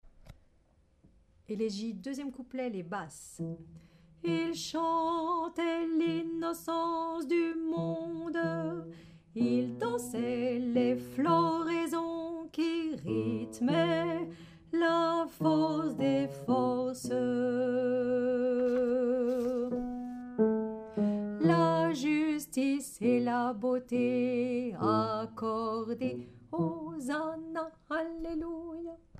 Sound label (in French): Basse